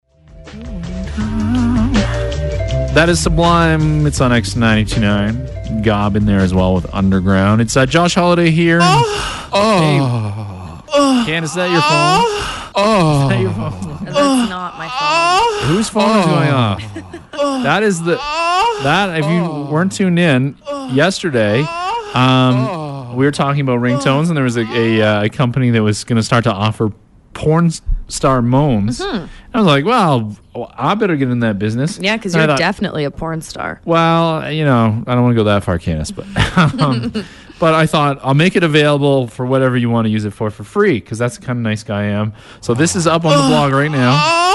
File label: Ringtones